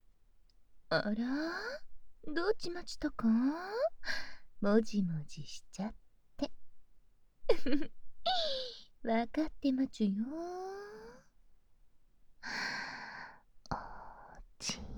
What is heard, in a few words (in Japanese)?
耳舐め 手コキ (左耳); 癒らしママのご褒美耳舐め手コキ